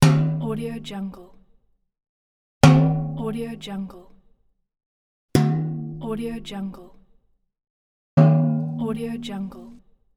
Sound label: metallic bounce